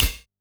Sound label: Ride; osu!